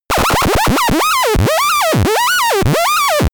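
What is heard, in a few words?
arcade enemy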